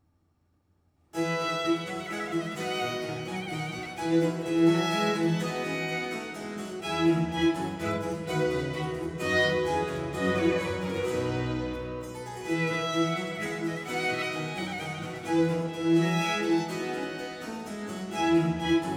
Concerto Grosso in F major; Corelli

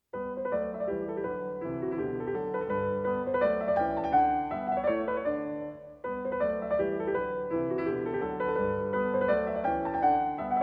Piano Sonata in G major, Vivace; Piano Sonata No. 25; "Cuckoo"; Beethoven

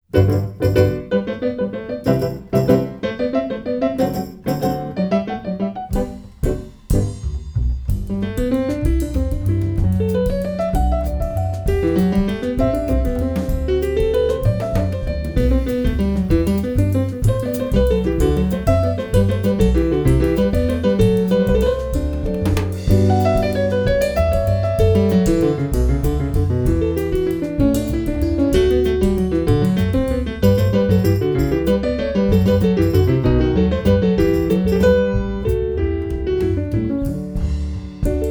Variations, for keyboard

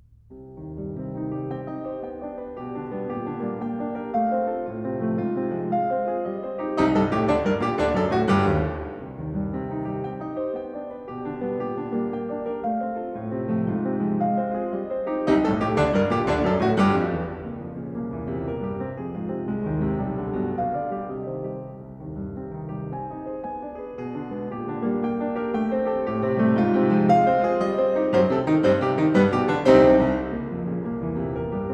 Piano Sonata in E flat major, Allegro; Beethoven; ("Quasi una fantasia"), Op. 27/1

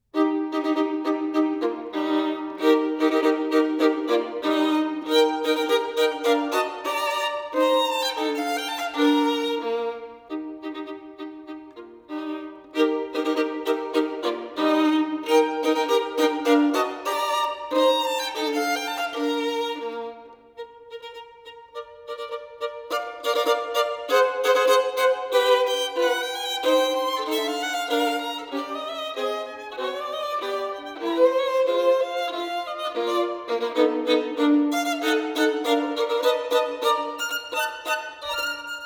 Caprice in E-Flat Major; Paganini 24 Caprices

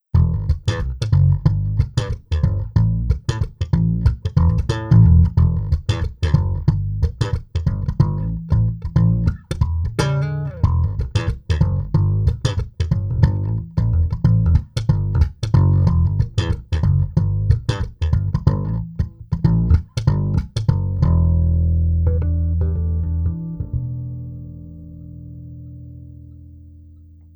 Bass slap

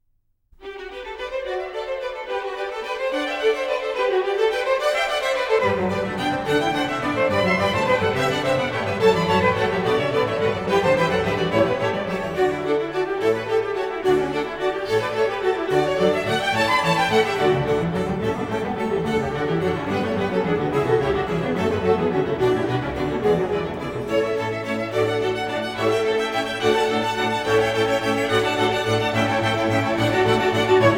Concerto in G major, Allegro; Bach; Brandenburg Concerto No. 3; BWV 1048

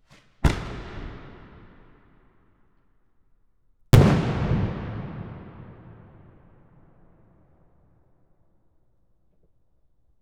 Aerial Explosion Left; Telarc Sampler, Vol 5

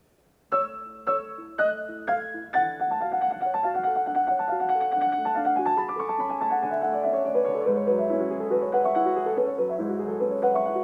for piano, Allegretto tranquillo; Visions fugitives

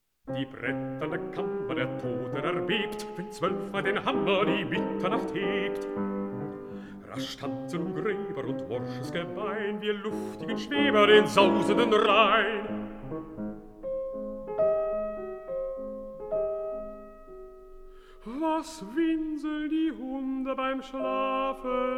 song for voice & piano

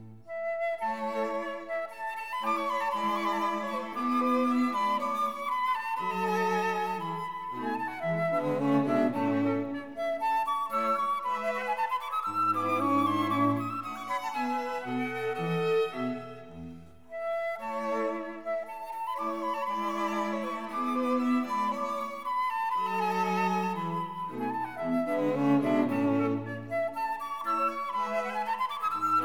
flute, violin, viola & cello; A major; Andantino; Quartet; K. 298; Mozart; The Flute Quartets

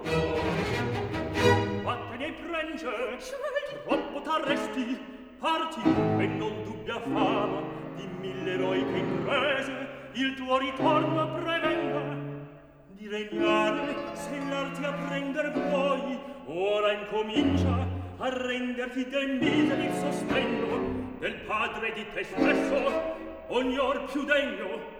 opera, Recitativo

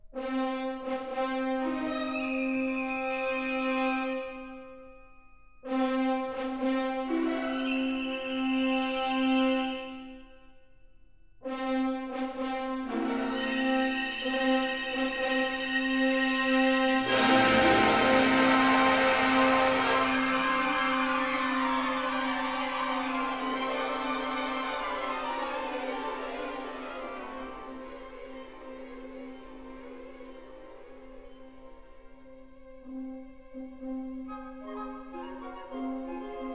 symphonic poem; Fontane di Roma (The Fountains of Rome); 2. Triton Fountain at morn; Respighi

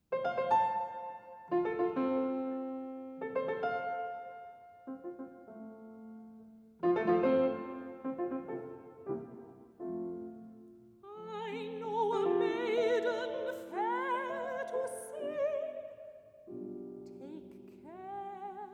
song for voice & piano; Gounod; Beware: I know a maiden

